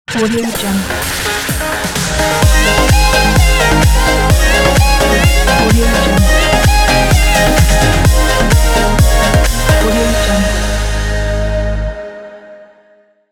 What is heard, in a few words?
edm jingle logo